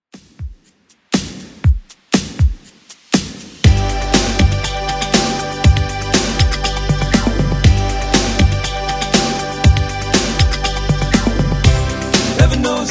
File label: 80s 120bpm; Redrum